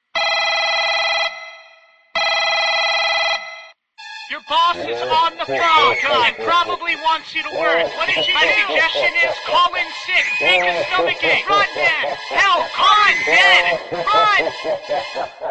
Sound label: ringtone; boss calling